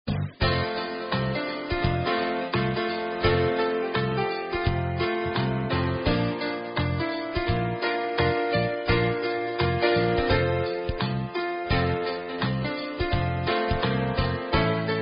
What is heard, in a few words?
Karaoke Songs; Girl Scouts